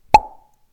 Pop

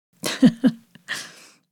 Close Female Laugh

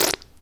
Slime Attack

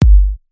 Kick Style Kick Drum Alternate

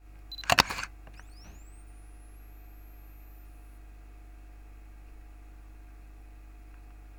Photo Click

